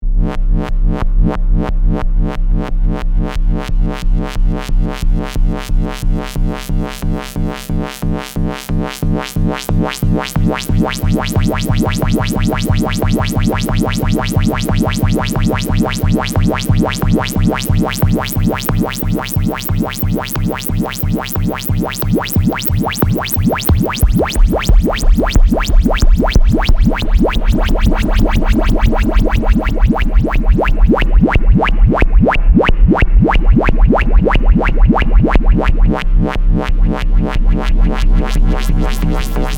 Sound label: Subtractive Synthesis